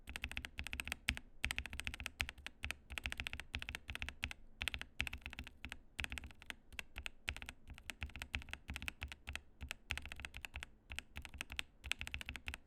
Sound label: Gateron Pro Yellow; avax gateron kapcsolok